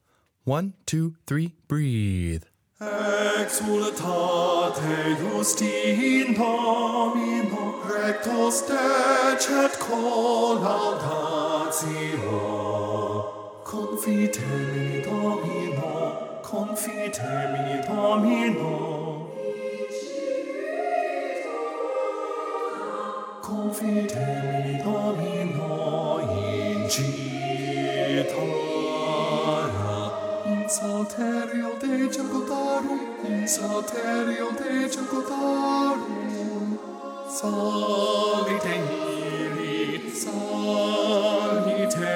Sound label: exultate justi in domino bass, choirs